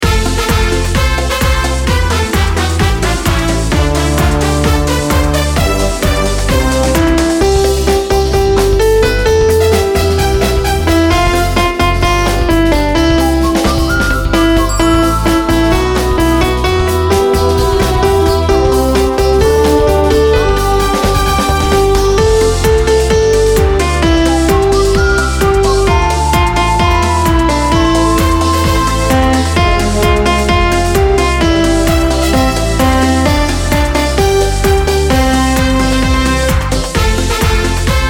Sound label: karaoke